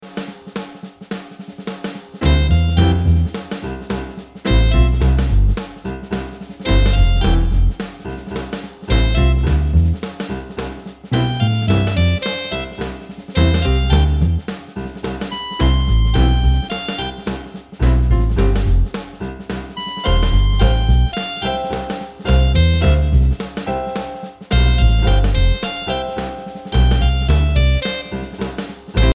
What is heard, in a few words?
jazz drum